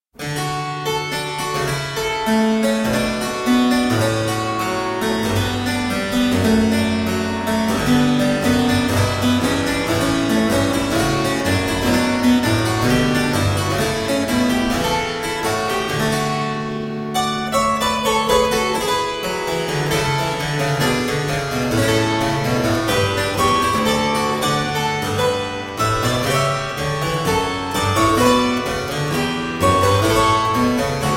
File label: Suite no 6 in E Flat Major - Prelude, spoken; Johann Mattheson; The Twelve Suites of 1714